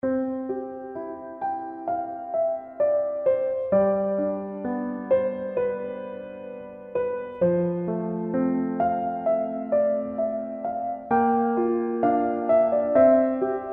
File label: little piano